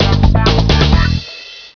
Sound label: Shift Completed; Paul Blart Mall Cop